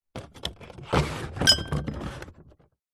household drinking glasses new slide in or out of box